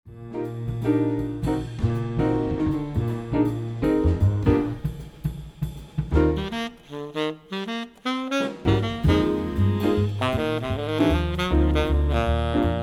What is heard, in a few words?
Jazz Drums Play A Long